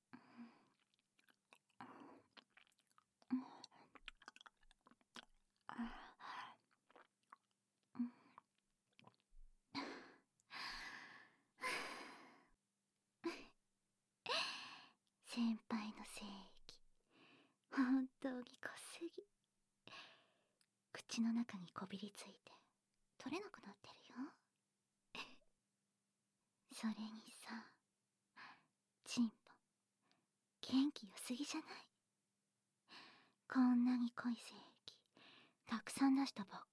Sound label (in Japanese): 耳舐め, ASMR; 美月の騎乗位と芽衣の甘々耳舐め♪